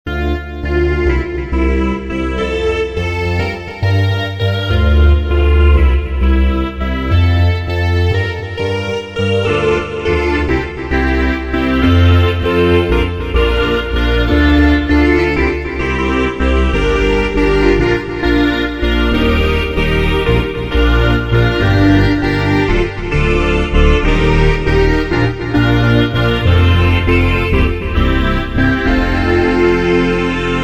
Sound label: Jumper